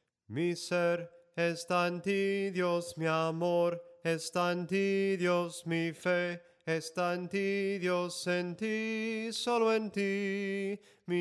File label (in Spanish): SOPRANO CANTADO; cantos del camino